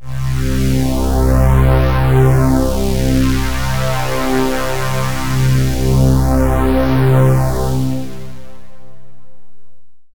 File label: SYNTH